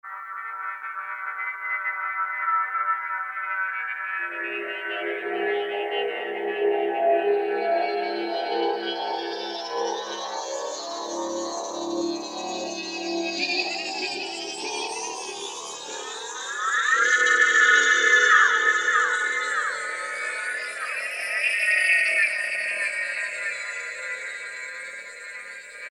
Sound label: Roland JP-8000, Snowman; Factory Patches